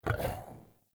Wolf Land Heavy, Vocals; Animals; Actions

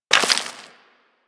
Bullet Impact Debris Rock; Warframe; Weapons

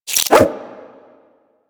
Nunchuck Start Whoosh B, Infested, Weapons; Warframe

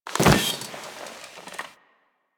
Classroom Chair Sit; Ambience; Warframe; The New War; Quests; Zariman

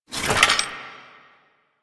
Generic Foley; Warframe; Hoplite

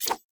Dagger Whoosh; Sinecure Dagger

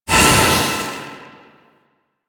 Void Release Blunt; Weapons; Warframe; Duviri; Incarnon Adapters

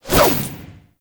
Jump Whoosh; Enemies; Foley; Generic Foley; Warframe; Duviri; Dax

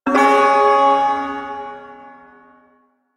Ersatz Shawzin Chromatic Chord; Music; Chords

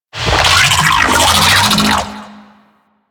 Vocal Death; Sentient; Warframe; Sentients; Dolicholyst

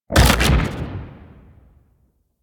Shotgun Alt Shot; Weapons; Revenant; Warframe; Tenno

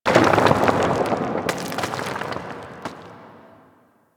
Dust Fall Medium; Warframe; Ambience; Orokin Moon

